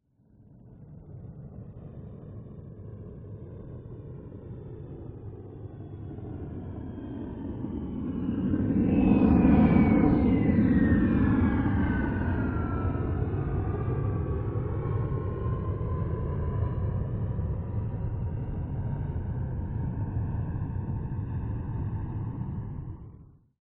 TRANSPORTATION JET MILITARY A-10 Thunderbolt Flyby Right To Left Distant; smartsound